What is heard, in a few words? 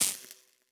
Spark, Electric; Sci-Fi Sounds